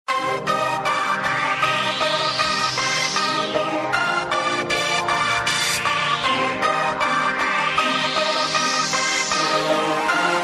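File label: instrumental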